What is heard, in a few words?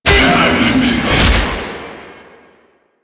Zoo Ape Jump; Prelate; Warframe; NineteenNinetyNine; Stage10TheZoo